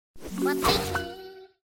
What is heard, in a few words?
duolingo 10 correct answers